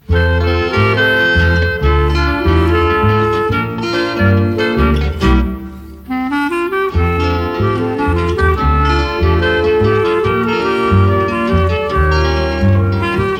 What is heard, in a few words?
Klarinet Bas Guitar